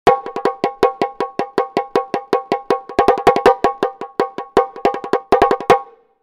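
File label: Tension 2, Tsukeshime-daiko, Raised 2 semitones; TAIKO THUNDER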